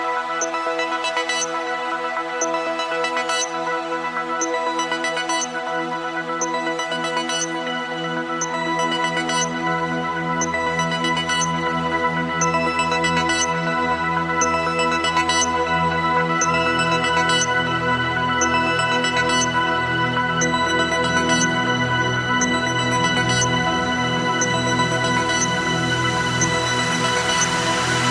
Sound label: Countdown